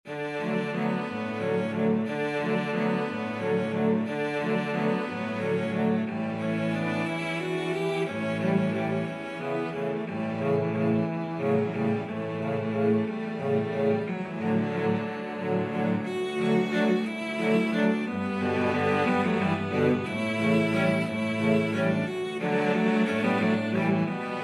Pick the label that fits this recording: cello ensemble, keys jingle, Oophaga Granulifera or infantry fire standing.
cello ensemble